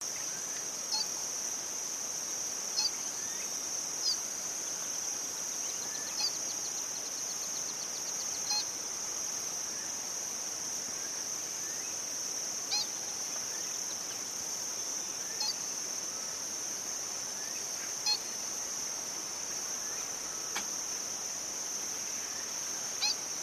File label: Least grebe; پرنده کشیم کمینه